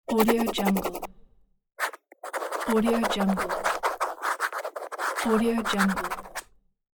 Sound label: pen